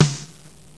snare

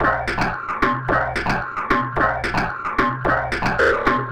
Beat, Concrete Beats, Electronica

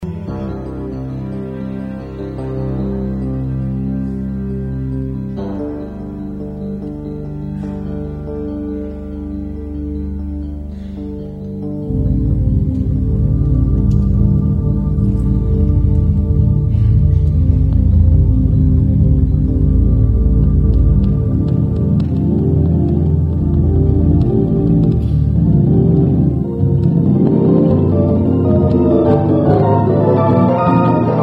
Harpsichord, Infinite Guitar

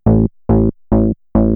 bassline, dance

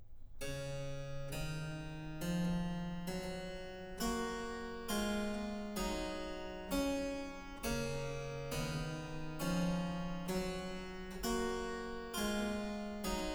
Canons on the Goldberg ground (harpsichord solo); Bach; XIV Canons on the Goldberg ground, BWV 1087; IV. Motu contrario e rectu

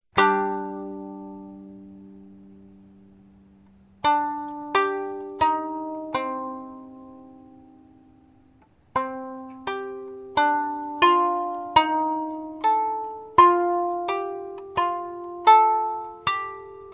Harp Piece; New Age; Ancient Sumerians, Egyptians, and Greeks

